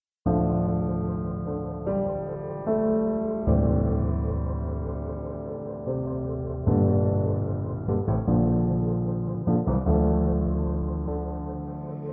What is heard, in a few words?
karaoke lower